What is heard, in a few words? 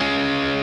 Arp. C#m7